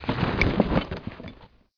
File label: satchel holster